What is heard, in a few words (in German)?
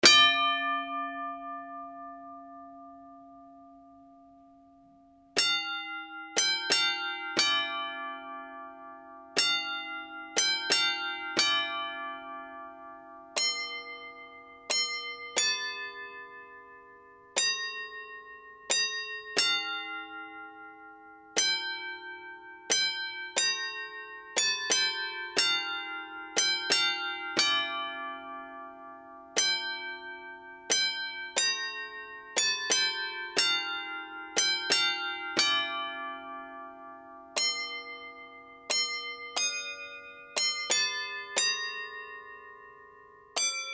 tram glockenspiel stille nacht